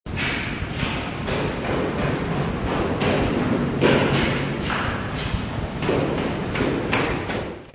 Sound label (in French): pas escaliers 2